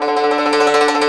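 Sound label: YANGCHIN tremolo; INIS - Opium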